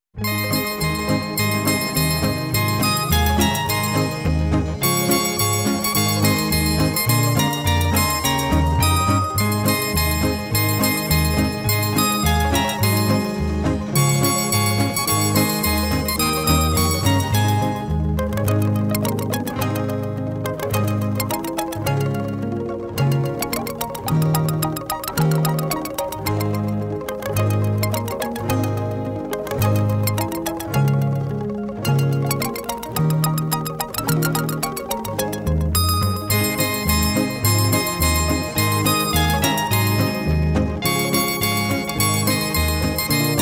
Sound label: Jingle Bells